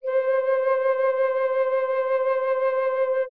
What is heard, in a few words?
flute C5 chorus